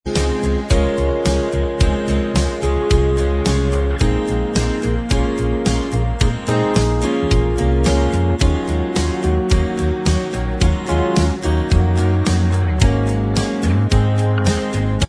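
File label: instrumental, backing track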